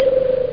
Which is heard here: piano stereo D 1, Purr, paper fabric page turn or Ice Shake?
Purr